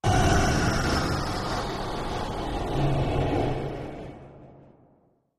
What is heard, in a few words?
Shut Down, Machine, Large Shutdown